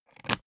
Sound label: briefcase plastic latch lock key insert quick; industrie wirtschaft; bueroarbeit